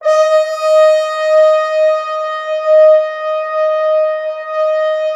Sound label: Orchestral Brass